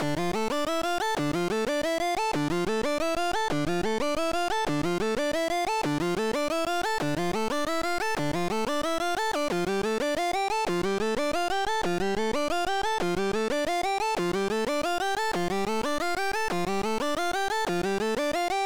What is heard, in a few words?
Poly Arp; super crush k.o.